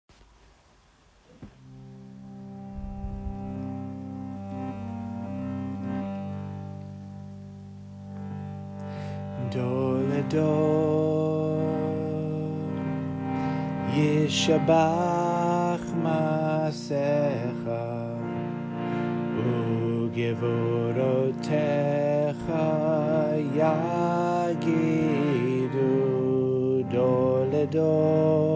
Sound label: dalet chant